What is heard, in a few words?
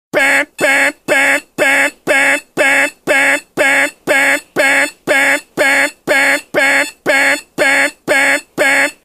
alarm clock; scout